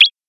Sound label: score bell; LodeRunner TotalRecall